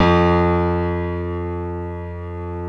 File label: WARM PIANO